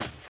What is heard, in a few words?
grass, footsteps walk; boomer